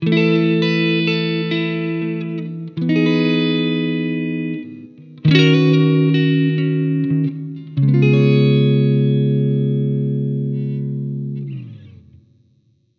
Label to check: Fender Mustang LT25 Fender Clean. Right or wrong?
Right